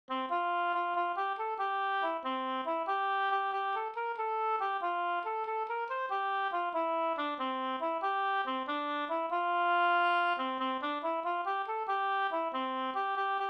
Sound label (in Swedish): visa; Ack om jag hade en daler